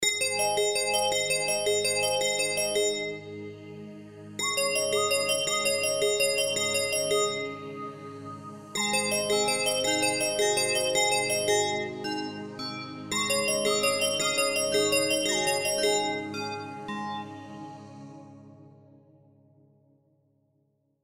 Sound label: Bell, ringtones; Coolpad; ESurfing-No1-2021